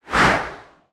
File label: Fireball Whoosh